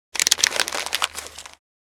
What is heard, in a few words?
Plastic paper short rustle